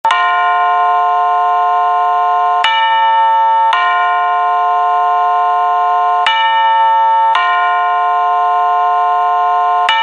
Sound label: Quad Bim Bam